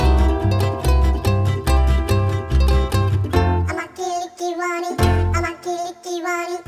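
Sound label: ocean world music suspense beach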